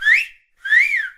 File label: whit whoo wolf whistle